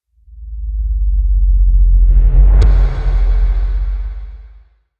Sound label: Cinematic Whoosh Hit